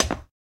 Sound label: glass step; Golden Crayon Sounds